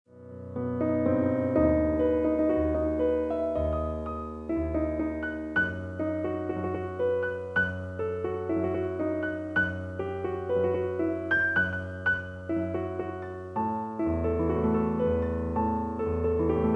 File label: Piano